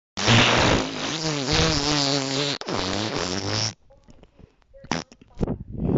fart

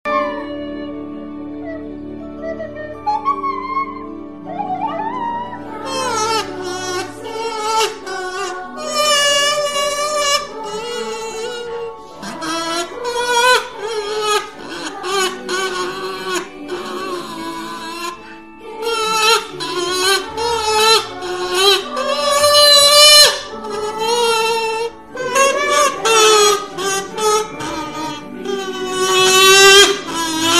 Ugly Flute and Kazoo; Home Alone; TikTok Audio

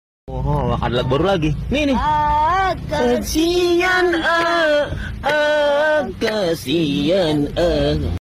aaaa kasian aaa meme sound

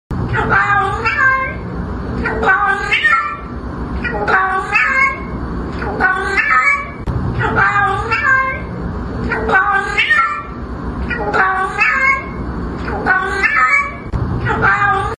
I go meow meme sound

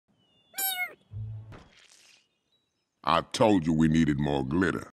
Meow; Mr. Dinkles

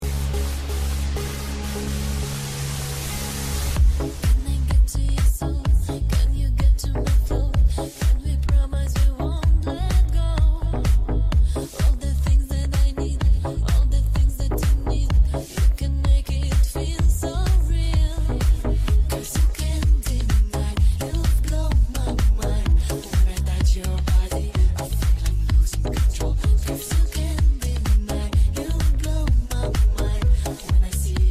Super Bass Boosted

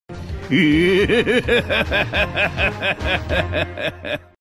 laugh; Doflamingo

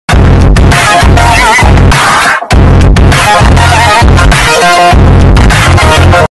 Earrape; Default Dance

